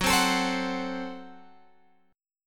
Gb Diminished, down, Guitar, Open G

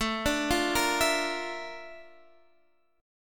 A sharp Major Sharp 11th

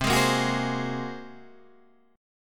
Bb 7th Suspended 2nd Sharp 5th C

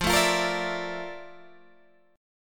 F Major 13th Guitar Standard; FM13 strummed

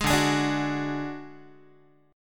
D Major Flat 5th D Guitar, chord

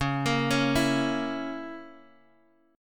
C sharp Minor 6th, Guitar Open F; arpeggiated; C#m6; x,8,10,8,7,x

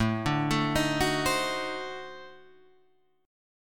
D 7th Flat 9th A, chord

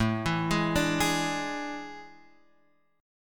A Major 7th Suspended 4th, Guitar, Open F; AM7sus4; arpeggiated; JGuitar

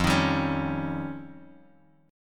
F 7th Suspended 2nd Sharp 5th F Bass Standard; F7sus2#5 strummed

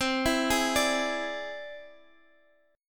E Augmented 7th C

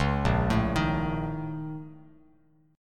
Bb Minor Major 11th Bb, Bass Standard; BbmM11; arpeggiated; JGuitar

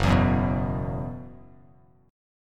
Gb 7th Suspended 4th Gb down Bass Standard, chord; Gb7sus4; strummed; JGuitar

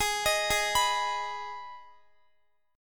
G sharp Minor G sharp down Guitar, chord; G#m arpeggiated

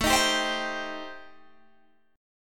A-Minor 7th Flat 5th, Guitar Open G, chord

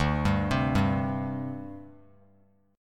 D 7th Suspended 4th, down; arpeggiated; JGuitar

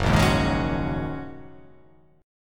Gb 6th Add 9th Gb down; strummed; Gb6add9; JGuitar

